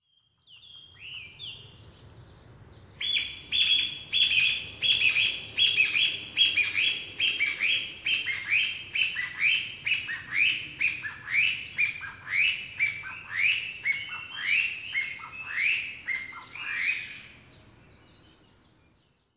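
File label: Chinese Bamboo Partridge; koe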